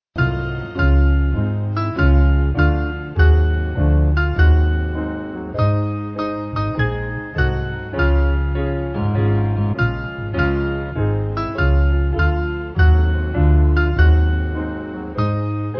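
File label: Band, Music Backing; There Is A Place; McAfee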